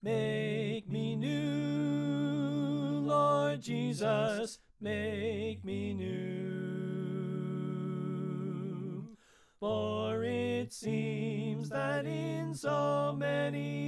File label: Tenor Left